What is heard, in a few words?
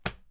card; puzzleweave